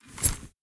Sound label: knife deploy; garrysmod; weapons; tigers